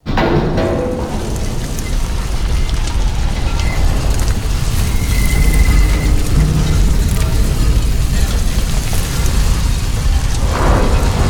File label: Spiral Door Close